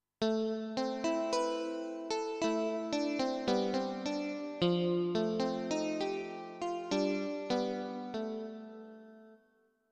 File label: Chorus Guitar